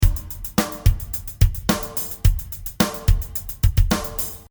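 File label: FX Drumloop pur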